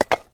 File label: Put Rock